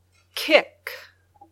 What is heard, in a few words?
kick